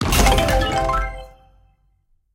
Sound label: explode quick stop many; serengetikings